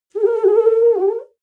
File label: clean window paper towel slow howl